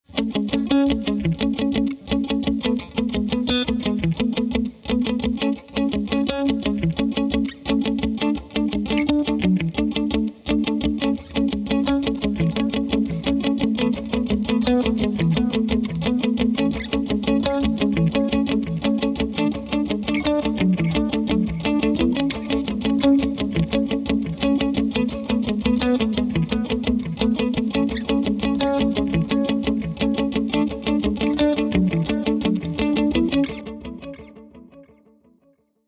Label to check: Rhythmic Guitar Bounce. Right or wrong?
Right